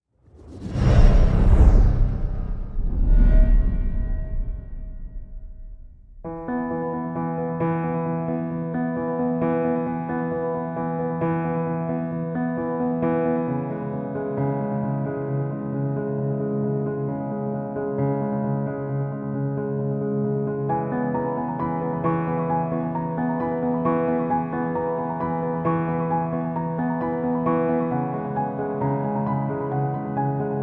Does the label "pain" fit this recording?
No